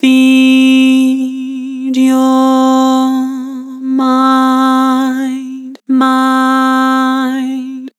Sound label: Vibrato, Vocals; Feed your mind; Harmor Vocals